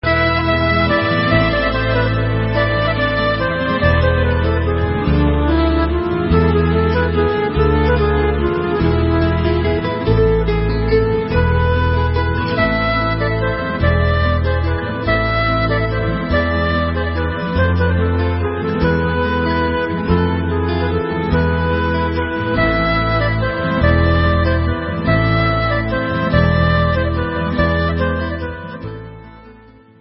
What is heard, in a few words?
Instrumental